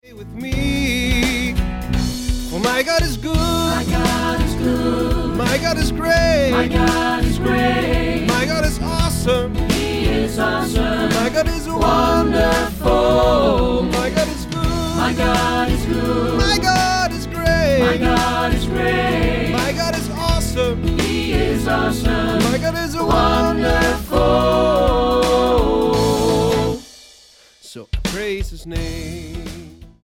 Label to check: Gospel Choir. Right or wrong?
Right